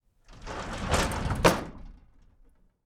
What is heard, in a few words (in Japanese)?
古い木のガラス戸を閉める音